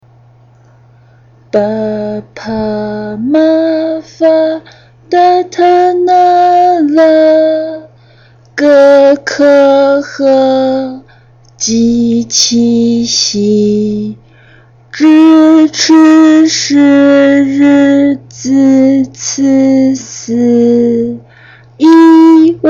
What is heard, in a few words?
little star slow